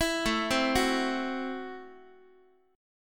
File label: A Minor 6th A, Guitar; Am6 arpeggiated; x,x,7,7,4,5; JGuitar